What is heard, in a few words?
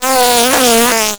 bzz